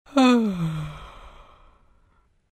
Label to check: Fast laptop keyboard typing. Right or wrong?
Wrong